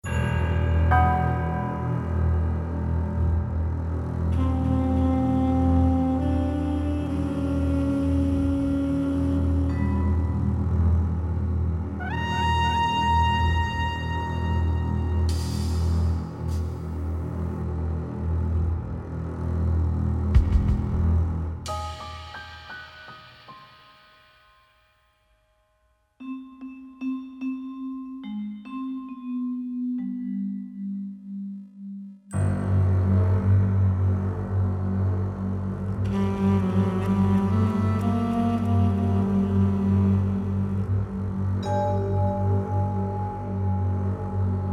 Suspense; Luigi's Mansion 3

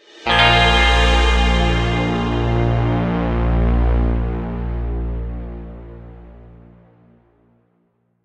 Mission Success; Fortnite Save the World

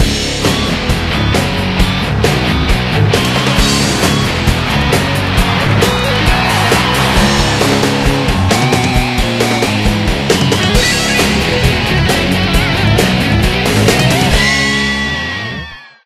Victory Theme; kurt warners arena football unleashed ps1 gamerip 2000

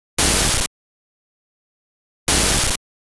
Enemy Shot

